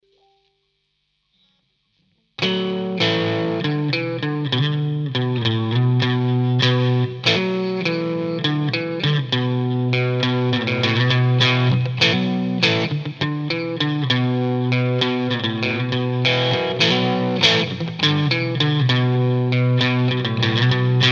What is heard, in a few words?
clean gain